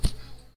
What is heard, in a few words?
rope shot; hedgewars